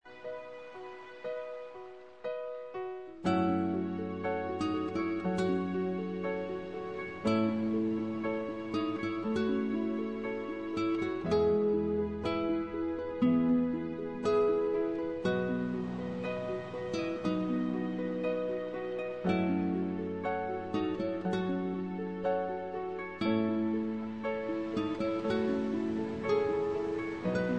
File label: Ocean Guitar